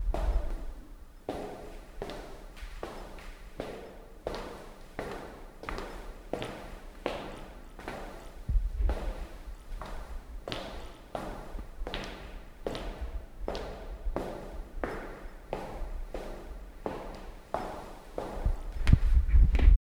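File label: footsteps in empty echoing, room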